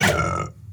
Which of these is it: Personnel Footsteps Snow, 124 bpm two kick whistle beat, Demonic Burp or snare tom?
Demonic Burp